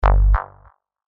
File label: F#, bass shots; Priest; revealed bass shots vol 4